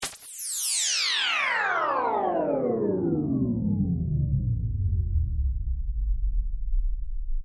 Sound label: Laser Stab Descend; Science, Fiction; BlastWave